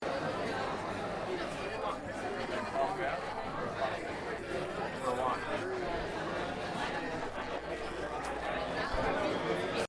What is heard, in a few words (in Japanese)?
ノイズテスト no voice; split by lalalai